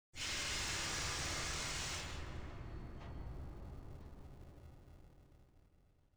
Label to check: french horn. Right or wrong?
Wrong